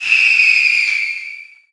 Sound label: Music Frontend